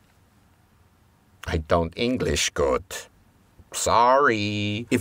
lip spank; how to start voice acting tutorials

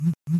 vibrate

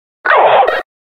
hit; combine

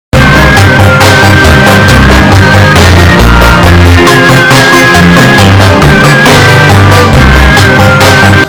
lose; brawl stars

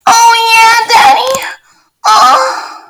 yahhhhh

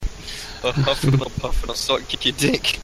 huff and puff

